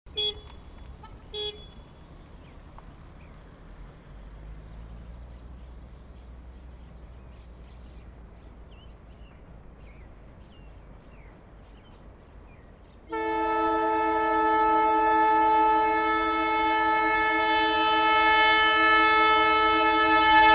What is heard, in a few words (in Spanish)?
tren belgrano cargas; timbues